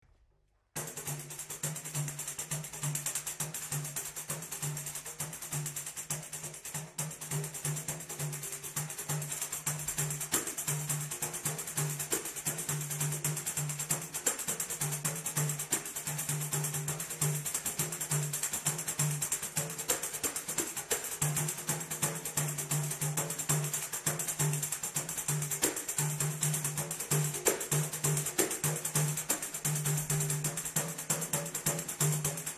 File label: pandeiro